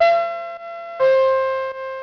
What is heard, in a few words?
pulse doorbell